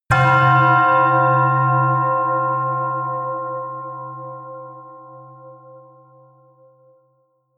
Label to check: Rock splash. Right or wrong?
Wrong